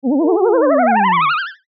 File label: sfx ufo warp; horror sf